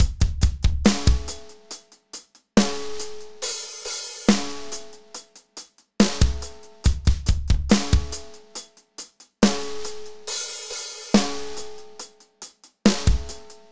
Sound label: Drum